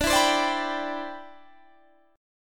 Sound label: D 13th Suspended 2nd D